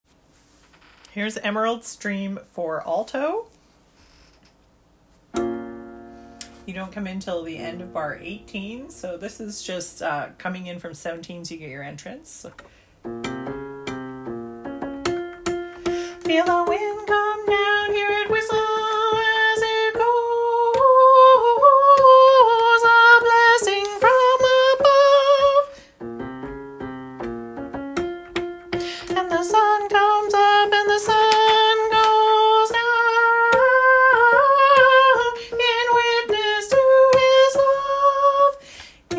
emerald stream alto

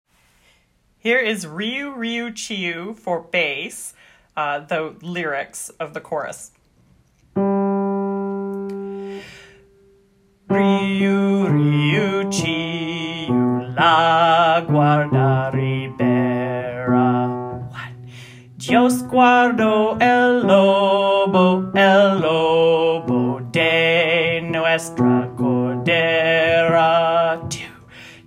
bass lyrics; riu riu chiu

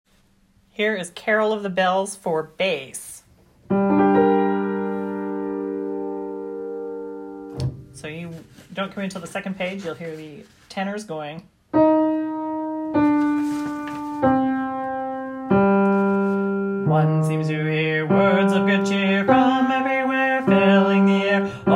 bass; carol of bells

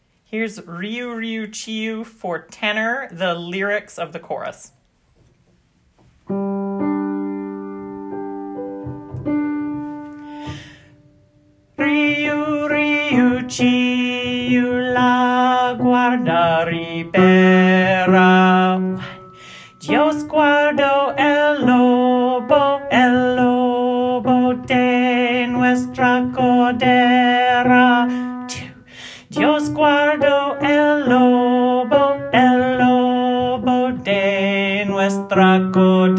tenor lyrics; riu riu chiu

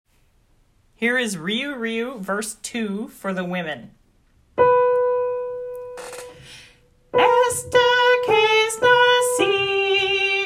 women verse sung; riu riu

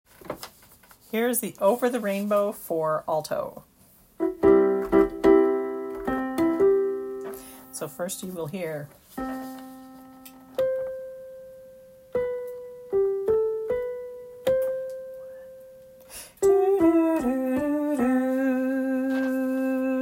alto

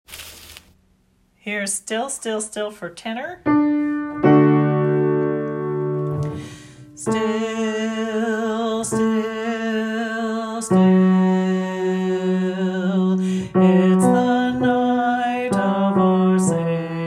tenor; still still still

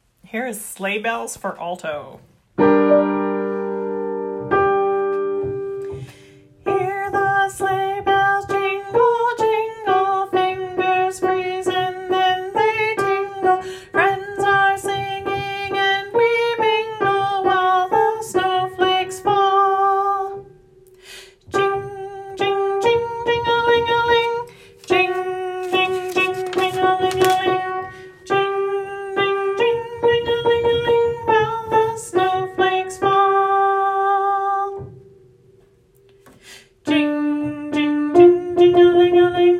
sleigh bells alto

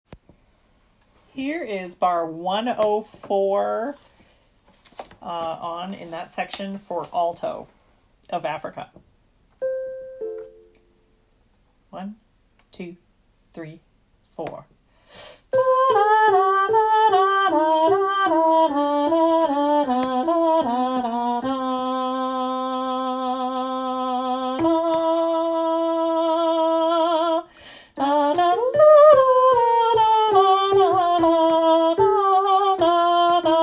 slow alto

